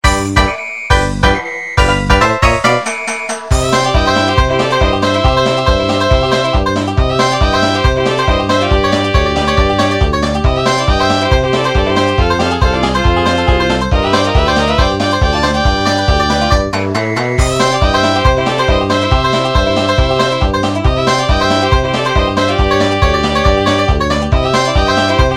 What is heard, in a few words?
moo moo farm, music minigame; tf2ware ultimate